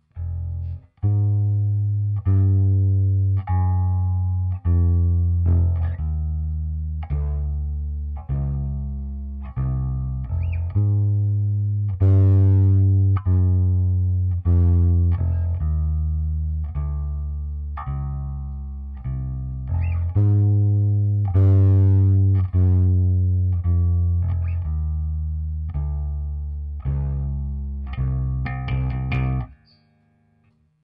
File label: low bass